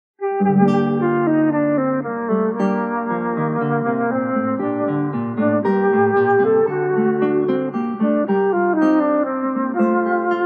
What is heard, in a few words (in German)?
allemande, querfloete; charles dieupart